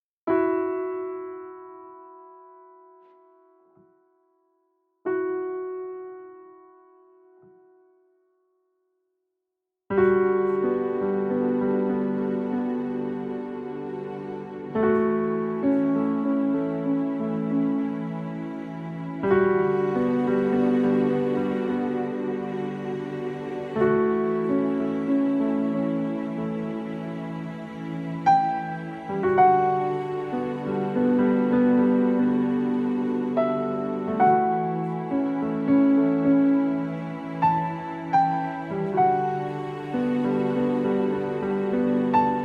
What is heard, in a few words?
Piano & Strings Version